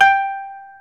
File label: KOTO G4